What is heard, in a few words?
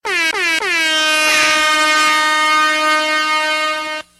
horn